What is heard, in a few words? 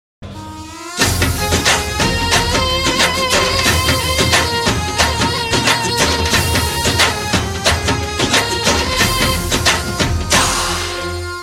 South Indian Tune